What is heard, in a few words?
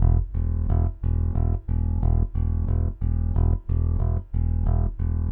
Rock, Bass; Bass X